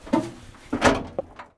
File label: Vehicle Hatches; Red Orchestra Ostfront 41-45